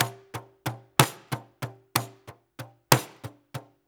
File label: 6-8 Pandeiro, Perc Loops; Drum Tools